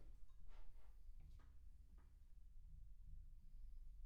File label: Room, 160 BPM